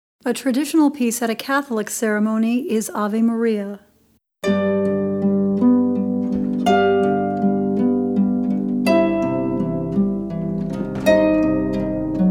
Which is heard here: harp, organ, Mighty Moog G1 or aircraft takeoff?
harp